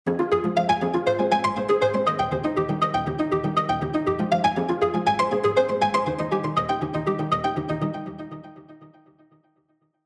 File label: Analog Strings Pluckhairs Arpeggiator Ton Modulation; Output